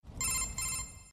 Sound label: Codec; MGR